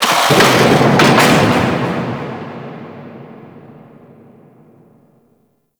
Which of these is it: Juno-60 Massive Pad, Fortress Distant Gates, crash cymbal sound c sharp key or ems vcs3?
Fortress Distant Gates